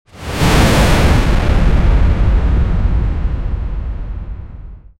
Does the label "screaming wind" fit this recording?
Yes